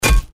Obstacle Hit; Looney Tunes - Acme Rocket Dash

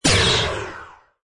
shoot; Danger Mouse - Ultimate

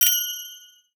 pickup gem bells

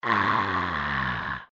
candy zombie; Adventure Time - Elemental; ThrillGames

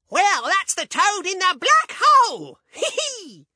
outro; Danger Mouse - Ultimate; penfold world5; toad in blackhole

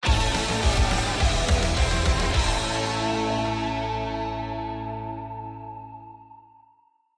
chapter complete

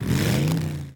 changelanes; Nickelodeon - Destruction Truck Derby

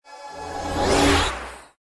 player fear; Adventure Time - Break The Worm; ThrillGames

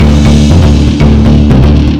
fire backing track 120bpm; Adventure Time - Elemental; ThrillGames

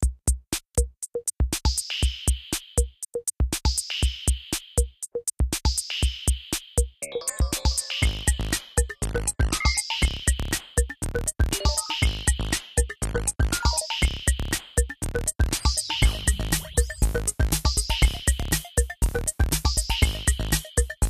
music menu loop; Adventure Time - Angry Betty; ThrillGames

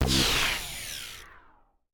puzzle failed; Ben 10 - Spot The Difference

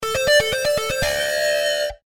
fire fill 120; Adventure Time - Elemental; ThrillGames

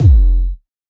power up; Little Big Runners

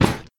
land; Nickelodeon - Destruction Truck Derby

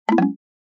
wrong; Dora and the Lost City of Gold - Jungle Match

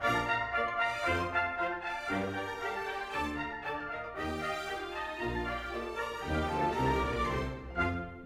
music photo complete screen; Dorothy And The Wizard Of Oz - Cookie Magic

